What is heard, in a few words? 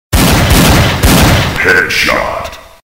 Head Shot